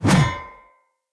Two Handed Mace Whoosh B; Weapons; Enemy; Blunt; Sinecure Two Handed Mace

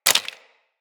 Bullet Impact Debris Wood

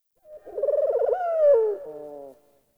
Tree Critters Scared; Ambience; Props; Warframe; Duviri

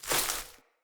grass step; Characters; Ambient; Sprout Farmers

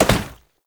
Step Metal, Foley; Warframe; Oraxia

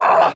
Pain, Barks; Kuva Lich; Persona A

